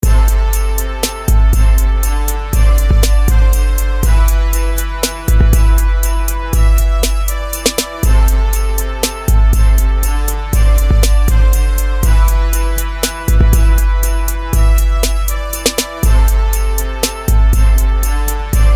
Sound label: Hip Hop